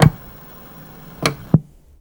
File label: TV Old On Off